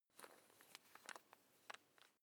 electric drill bit fasten wrench turn slow

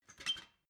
ladder metal handling short

